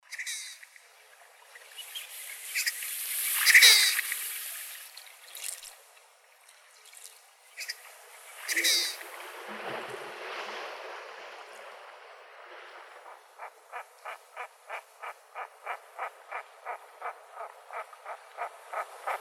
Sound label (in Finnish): tukkakoskelo